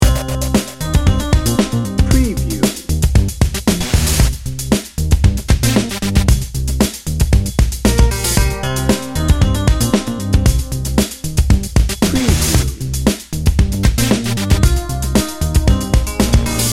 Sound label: crime story loop